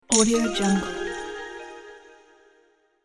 treasure gold revealed

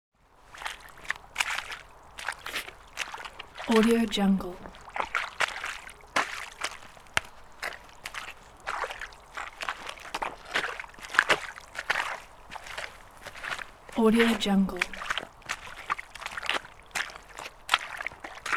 steps through the swamp; fatsound

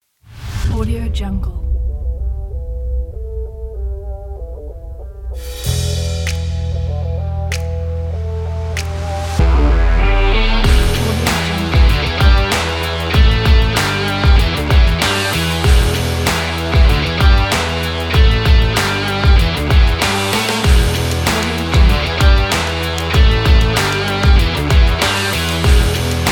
rock intro

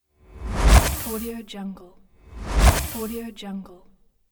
fast burst swoosh

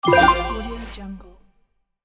soft level up

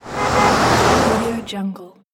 car passes by with signal

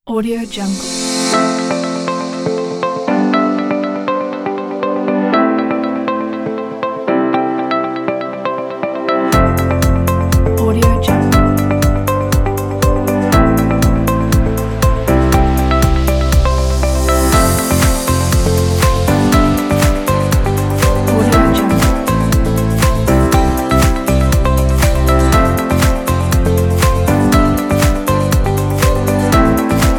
hopeful inspiring corporate pack

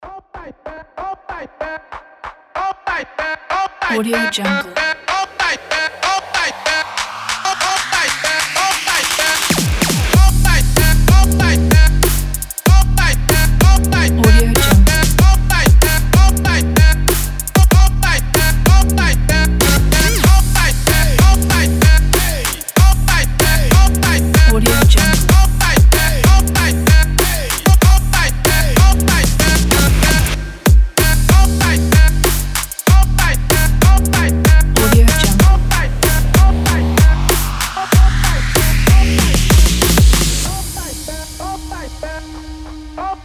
for the trap

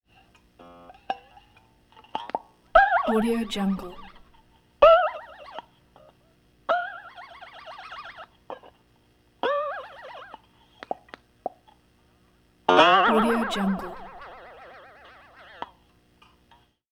boings wavering spring tones

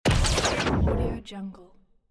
transformation short

